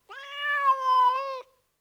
meow

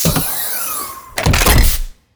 manipulate drone; activities planetoid

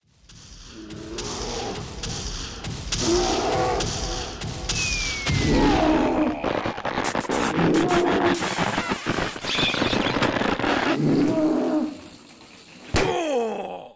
bear hug; Cosmic Rage

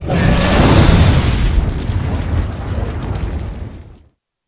breathe, combat, enemy; CosmicRage

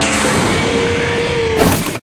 transfer open, doors; CosmicRage

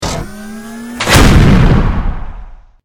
battlesuit grenade; general combat

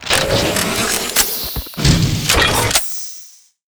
artifact; CosmicRage; ships misc

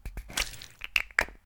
tooth, house

